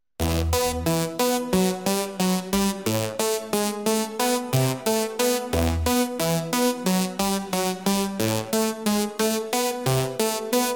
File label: Industrial Metal